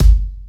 focused kick sound g key, kick one shot tuned to the G note